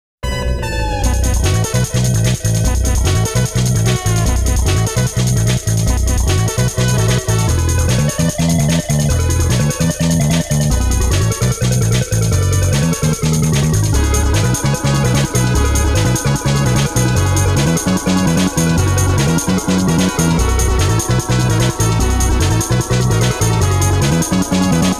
Bonus Round BGM; Namco Classic Collection Vol.2; arcade soundtrack

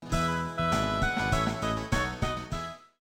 Jingle; 700-banjin no Atama o Yokusuru Chou Keisan DS 13000-mon Image Keisan; NDS gamerip

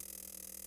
Save Station Active; A Robot Named Fight

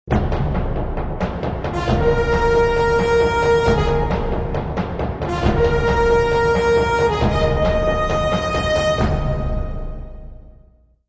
Medieval Combat; Civilization Revolution; Xbox

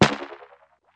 chargeup fire iterated

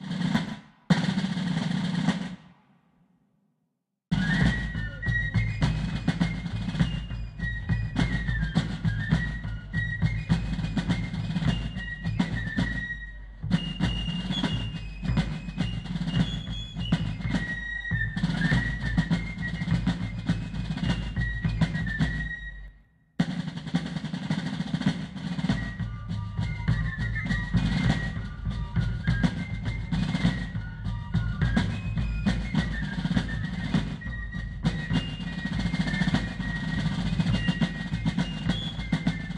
Fanfare Ambience; Assassin's Creed III

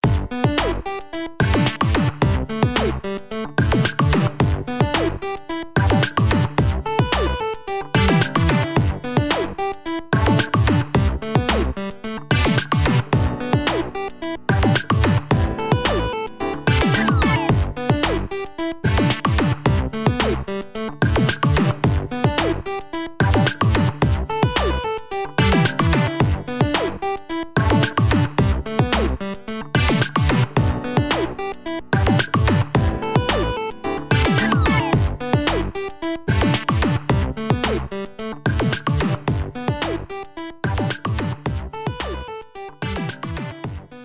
Jingle Item Box; taiko no tatsujin drum session; session de dodon ga don; ps4

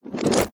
Crumble Block Respawn

